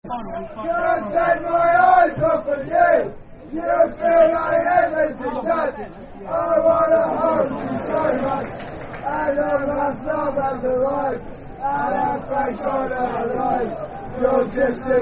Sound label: football chant, soccer songs; Fulham Ffc